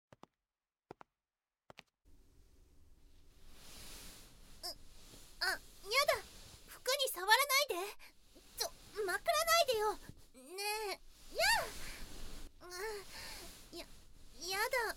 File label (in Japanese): 膨満腹部マッサージ 悪臭排泄 冷水洗浄 SE入り, ASMR; 満腹女子